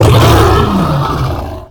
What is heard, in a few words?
Bronze Dragon Play